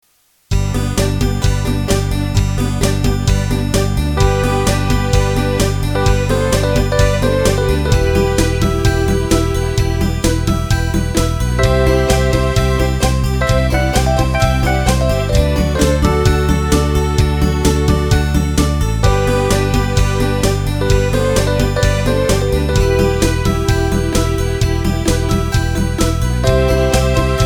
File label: Baroque